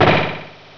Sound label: BANG